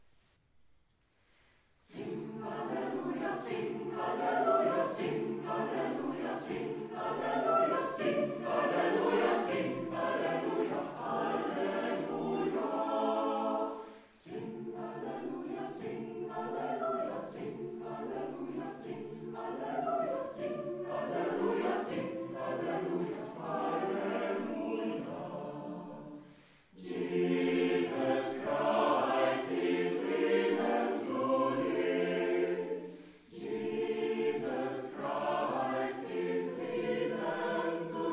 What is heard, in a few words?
Young Sing Alleluia